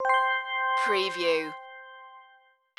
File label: idents ident